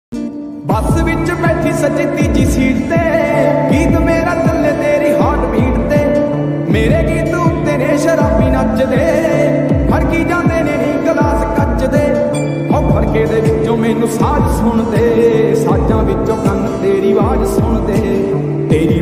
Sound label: lofi punjabi ringtone